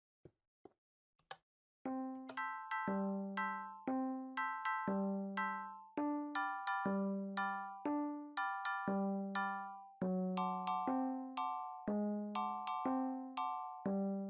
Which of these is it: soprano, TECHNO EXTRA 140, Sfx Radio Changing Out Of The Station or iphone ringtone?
iphone ringtone